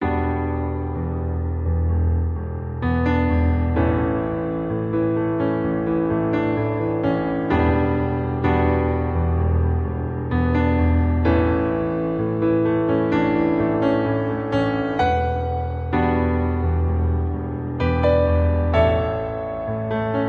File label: Piano Cover